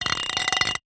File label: impacts glass piece broken light bulb fluorescent scrape concrete ground